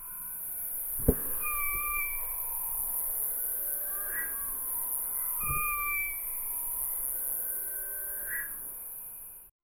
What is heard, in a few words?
an owl flies in and, fence